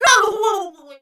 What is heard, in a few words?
Drown; drowning in water; Link drowning in water; zelda